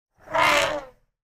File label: elephant trumpeting calm